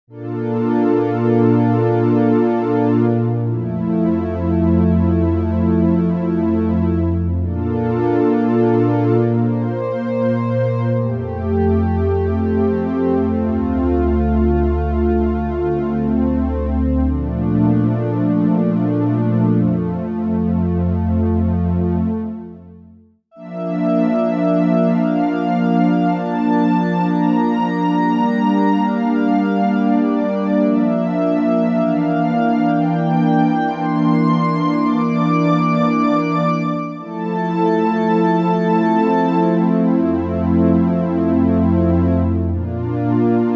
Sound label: Mellow Strings, Jupiter8; OPXPROII; opxpro2